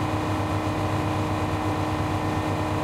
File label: valentine engine int rpm, tanks